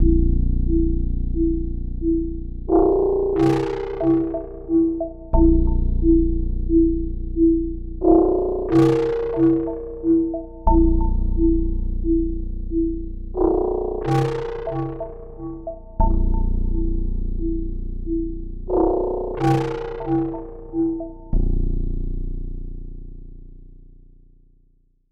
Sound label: Up to someting tricky, atmosphere, Film music